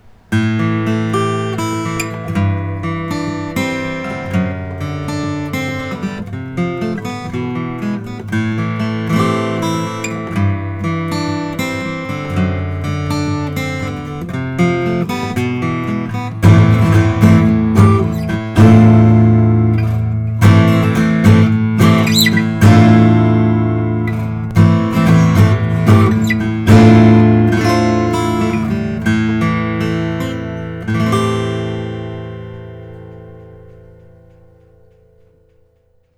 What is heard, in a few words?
goodall macassar ebony natural